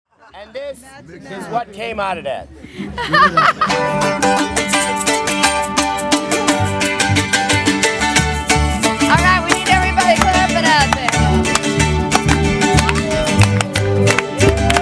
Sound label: Cajun Hoedown